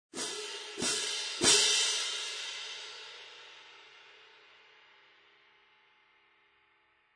Artisan Traditional Symphonic Medium Light, sabian; 20"; hand cymbals